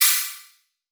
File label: hat, hithat